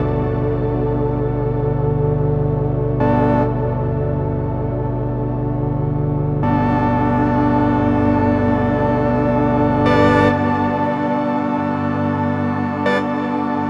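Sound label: Bpm140 Pad Loop G major, Dubstep Chord Loops; Raining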